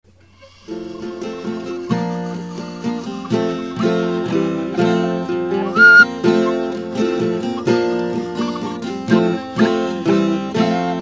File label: accompaniment Attacks